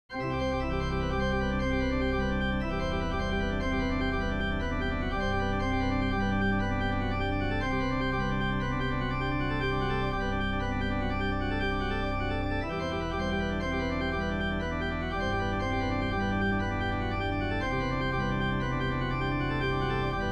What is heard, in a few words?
major chords round the cycle of fifths Bach temperament